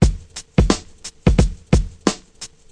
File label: beat, 088 bpm, drum loop